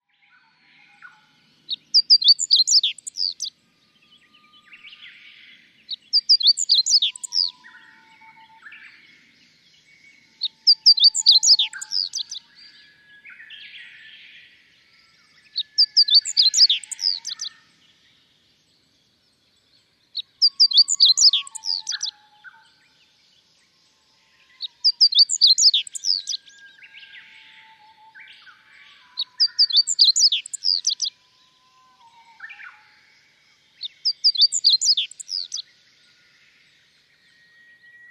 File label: hoojiro; ホオジロ; さえずり